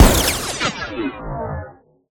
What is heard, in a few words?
soundwave weapon scifi shot; bluezone; sonniss gdc